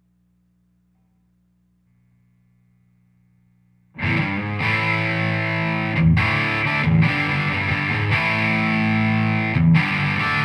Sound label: Telecaster Rock Bridge PU High Grind